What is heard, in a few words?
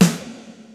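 Snare Small Ballad, Alesis D4 drum machine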